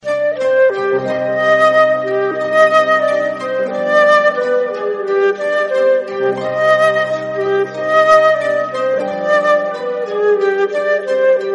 Madrasi flute ringtone

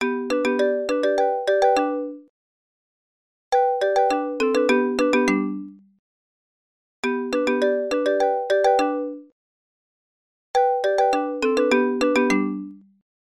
simple marimba ringtone

